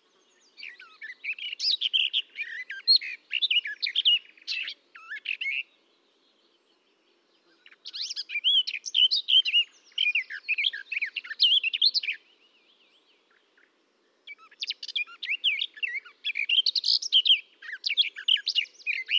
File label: sylbor song; a.celmins